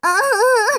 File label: gonome jump attack